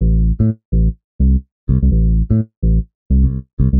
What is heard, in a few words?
126 A C BASS, Funky Underground bass loops; Loopmasters; Funky Underground Vol 2